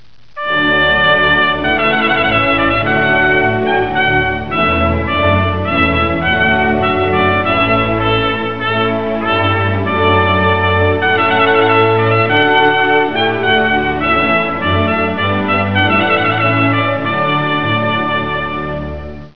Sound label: trumpet sound